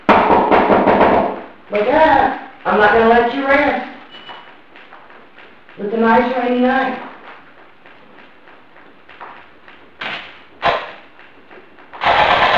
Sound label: walkie; Olympus Digital VN-1800; Walkie Talkie; Lost Church